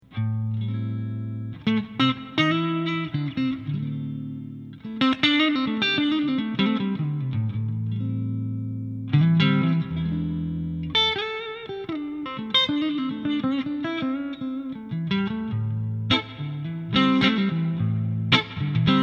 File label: Boutique Clean Blues